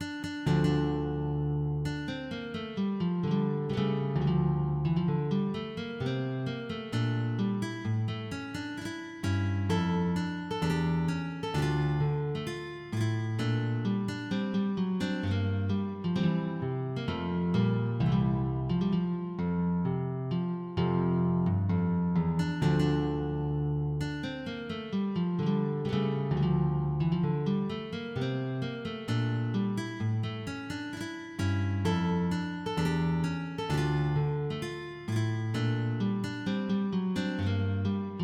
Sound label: Allemande Ré mineur